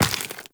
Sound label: Step Flesh, Footsteps, Walk; Warframe; Tenno